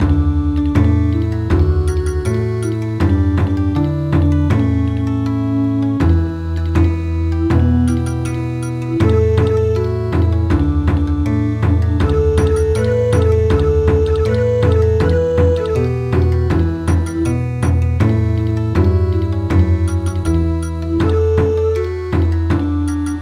Bon Odori

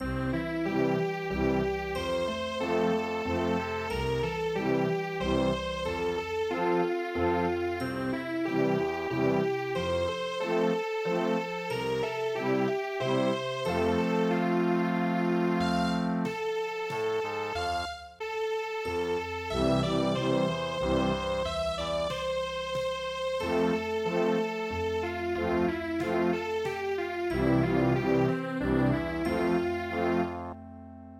waltz; KUKULECZKA

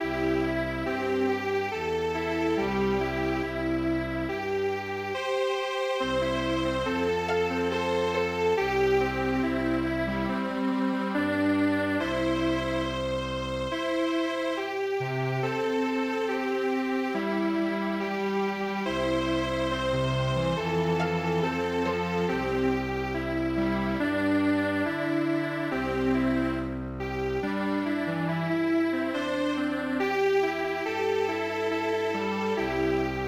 spring stream strings

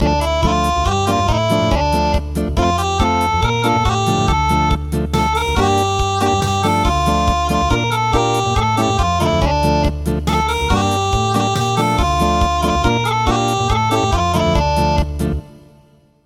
Acoustic; A new joy has been revealed

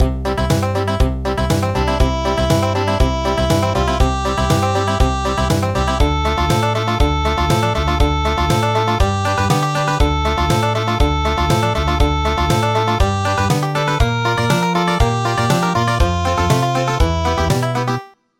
Country

